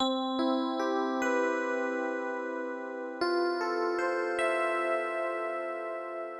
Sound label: c major 7 dx7 elec piano soul 75 bpm